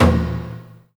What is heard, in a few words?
A#3 TONE, JAPANI PERC; Northstar; The Wizard VOL-1